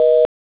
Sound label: Network Congestion, Samsung SGH-i600